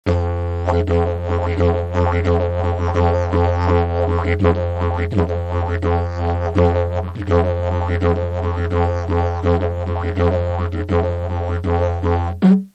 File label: dit dhu, didge